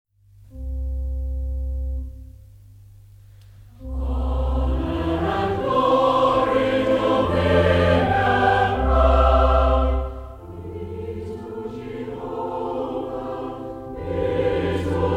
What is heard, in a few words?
Choir, hymns